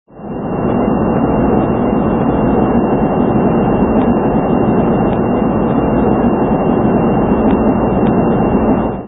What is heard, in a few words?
Cabin Cruise